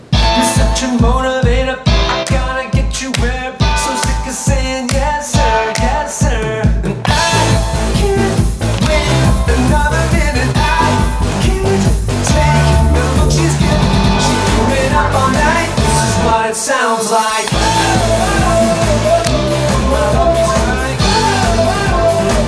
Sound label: Cheer Tryout Music; Howard MS